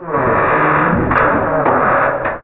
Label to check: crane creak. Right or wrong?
Right